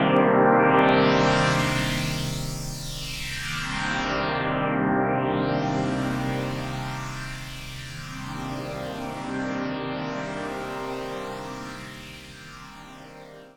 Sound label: BIG SWP2 D, AMBIENT PAD